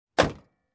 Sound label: Closing Car Door